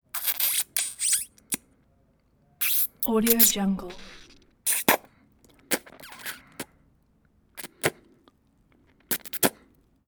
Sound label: mouth sucks